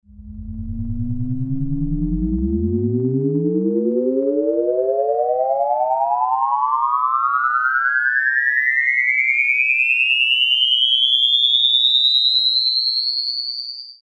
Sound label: Flying saucer